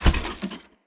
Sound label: Step Vegetation, Footsteps; Enemy; Characters; Mendicant; Mnd King